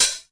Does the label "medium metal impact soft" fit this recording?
No